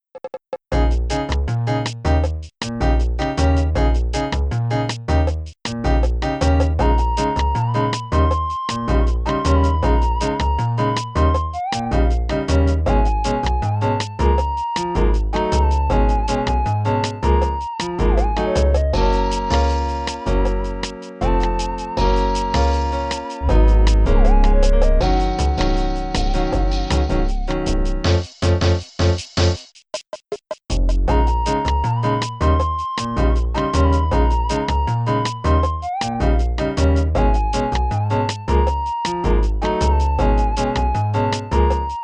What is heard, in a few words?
Bossa Nova; the sims bustin out; gba